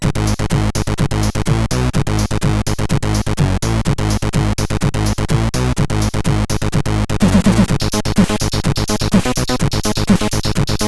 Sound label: Techno, CSG8580R5; Techno 4 second